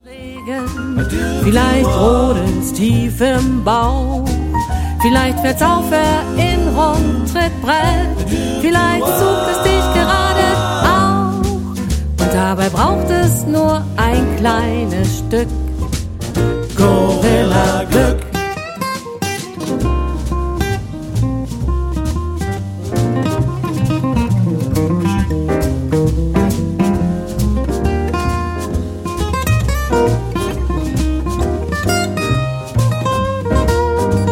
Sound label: blues